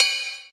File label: crash single hit d key